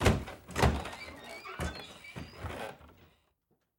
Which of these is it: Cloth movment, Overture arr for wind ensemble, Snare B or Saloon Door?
Saloon Door